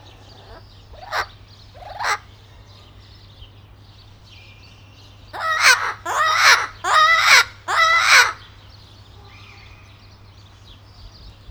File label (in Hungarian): kekhomloku amazonpapagaj; állathangok; nyíregyházi zoo 2010